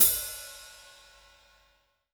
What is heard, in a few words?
H.H. HIT, STUDIO STEREO HI HAT